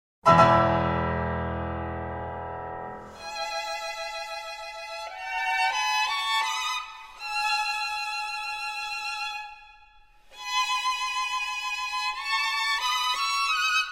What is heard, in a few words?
Navarra for two violins and piano